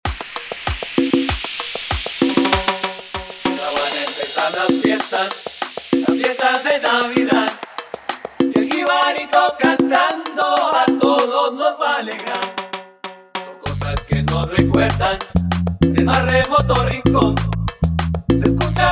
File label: acapella 97 bpm